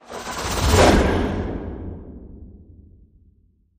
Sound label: Breathless Flutter Flyby